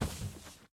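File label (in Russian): мягкий короткий приглушённый звук выстрела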